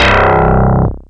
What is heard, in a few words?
DBL, baesse